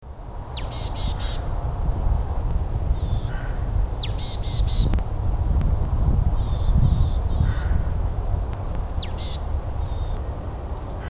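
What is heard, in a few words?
P pal and P mont calls, background C cor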